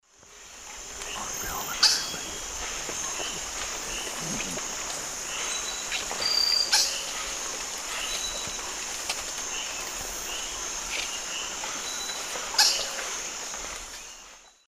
Piaya minuta, calls; S. America; Eastern Lowlands

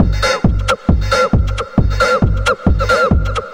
Drums Techno

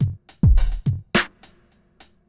eastcoast shuffle 105

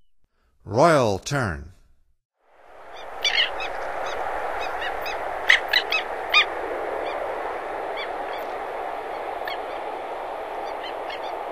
Royal Tern; North America; Stokes

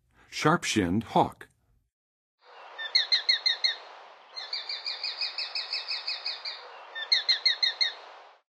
Sharp-shinned Hawk; North America; Western USA; Western USA Songbirds

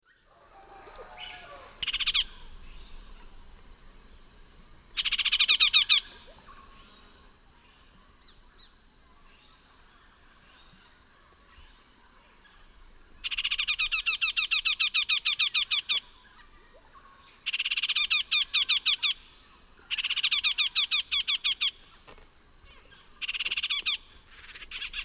Melanerpes cruentatus, group calls; S. America; Eastern Lowlands

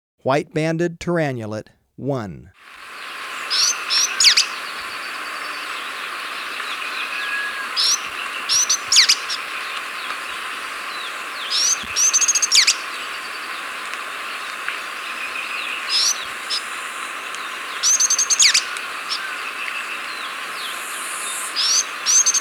White-Banded Tyrannulet; S. America

